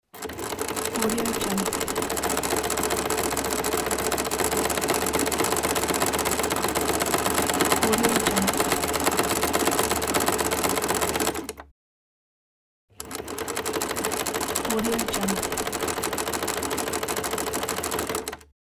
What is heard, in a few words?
sewing machine